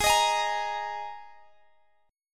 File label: Ab Suspended 2nd, Guitar, Open G; x,x,18,20,21,20; down